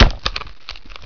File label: STAB